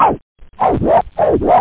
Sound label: leadin, scratch